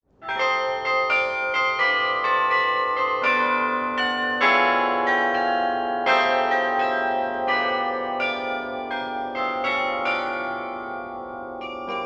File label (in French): carillon; orchies